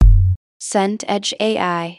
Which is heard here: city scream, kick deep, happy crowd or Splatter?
kick deep